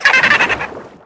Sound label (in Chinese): 海豚 移动; 红色警戒2; RA2; 作者：西木工作室